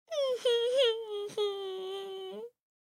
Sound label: Human Pout Whimper, Pout Whimper Male Seven Yrs Boy; Mouth; Blastwave; Humans